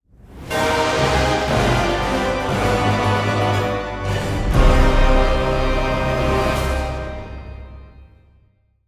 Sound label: Win Theme